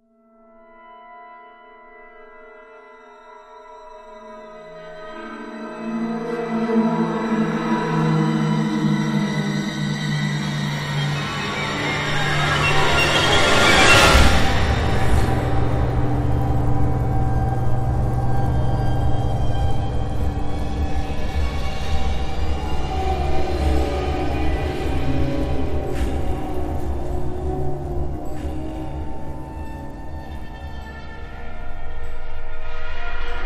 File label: dark ambience